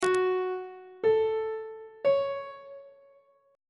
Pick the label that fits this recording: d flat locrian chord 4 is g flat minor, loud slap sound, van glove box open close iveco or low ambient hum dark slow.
d flat locrian chord 4 is g flat minor